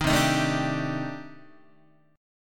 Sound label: C Minor 6th Add 9th C Guitar; Cm6add9 strummed; JGuitar